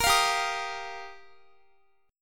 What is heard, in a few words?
G Minor 7th Sharp 5th G, Guitar; strummed; 4E,4A,5D,5G,5C,6F; Gm7#5; x,x,20,22,19,22